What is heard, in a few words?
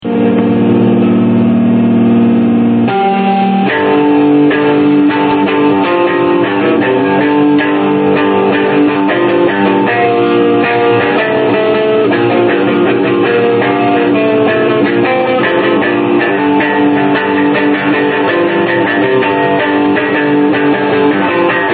metal balalaika; maxurov